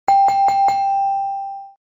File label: correct; breakupgame